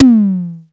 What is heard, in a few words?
tom, Tama TS-305